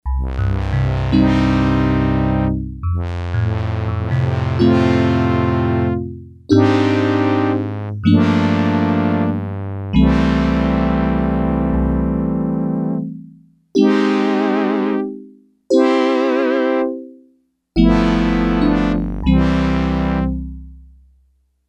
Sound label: Pianobrass, Yamaha DX100; Factory Patches